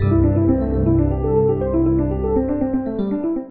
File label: Reelspin; crystal Gems; Core Gaming